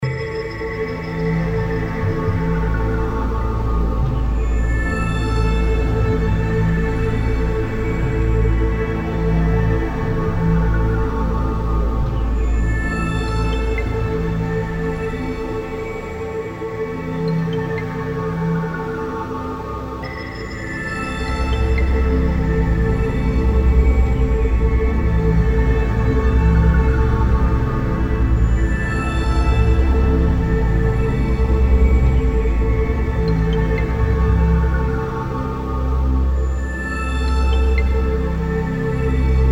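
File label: background music, Terror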